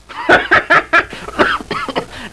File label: LAUGH